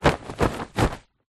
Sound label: household pillow case shake